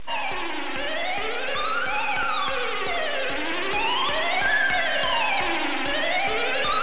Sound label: scifi